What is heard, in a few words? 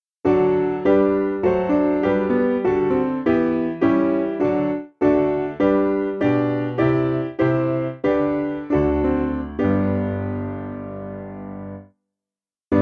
S Piano